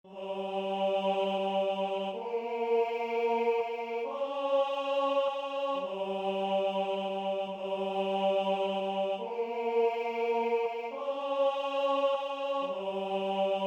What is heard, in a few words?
tenor